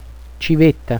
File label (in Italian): civetta